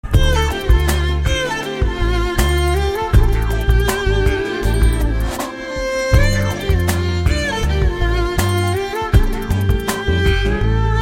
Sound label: violin melody; classical